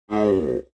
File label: Monster vocalization